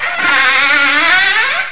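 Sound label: Door open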